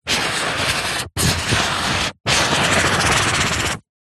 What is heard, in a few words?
office pen felt tip strokes on cardboard sheet